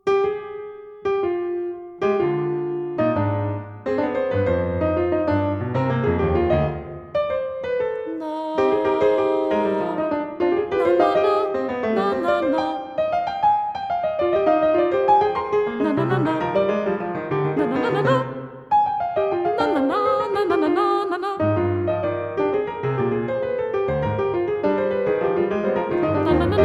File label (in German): Quintett; kammermusik; VERRUCA